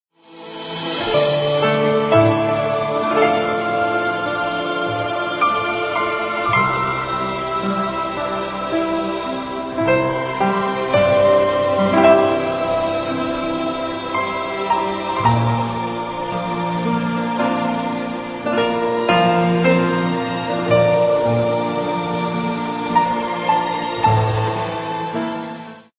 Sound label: Mobile ringtone